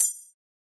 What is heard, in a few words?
metal